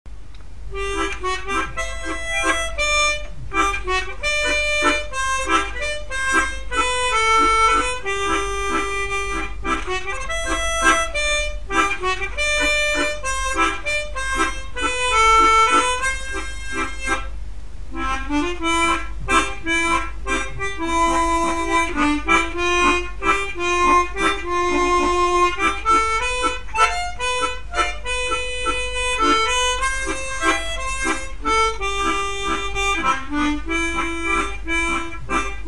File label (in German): Schneewalzer